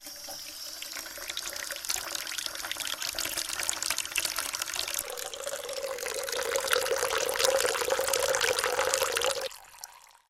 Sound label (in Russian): voda kran v prostranstve